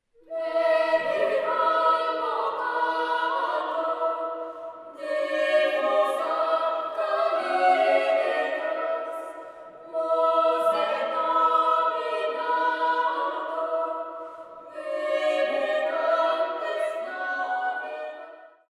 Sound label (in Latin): conductus motete; Belial vocatur Tenura